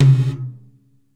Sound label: Gated Tom; Univerb; creative gate; Gated Reverb